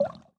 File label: reel Bounce; netent; luckyangler